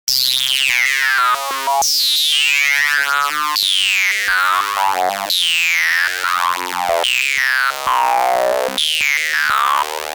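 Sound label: Bass, dry wet; SAM Earritant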